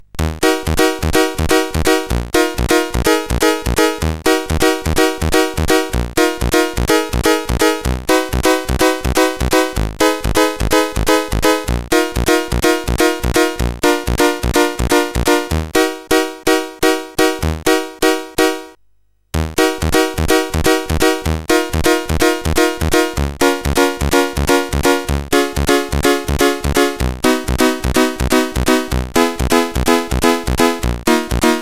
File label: Piano Work, sid CSG8580R5; hvsc